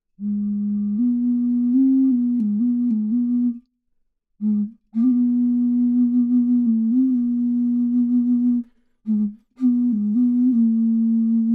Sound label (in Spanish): bajo, ocarina bajo